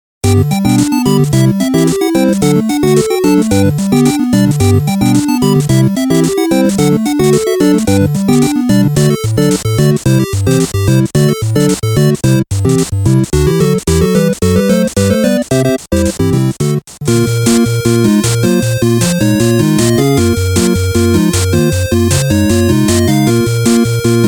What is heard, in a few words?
8-bit gamer music